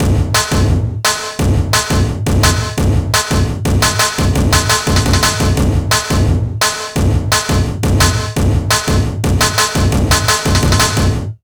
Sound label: 172 BREAK, Explosive Jungle; USB Soundscan vol.05; AKAI